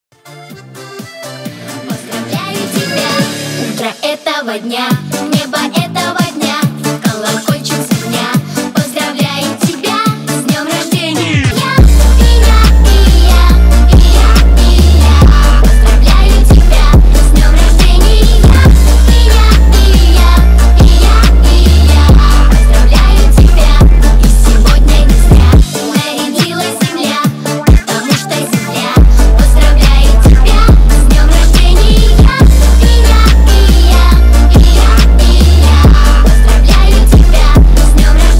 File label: Phonk Remix